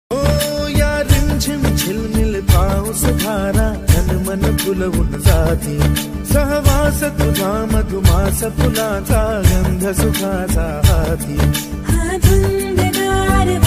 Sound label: rainy song